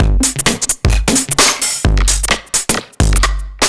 Beat Valve 130, distorted drum loops 130bpm; musicradar

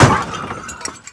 SKID CRASH; AKAI S-Series CD-ROM Sound Library VOL-8; SFX

